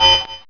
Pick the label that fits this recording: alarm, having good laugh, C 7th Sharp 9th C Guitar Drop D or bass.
alarm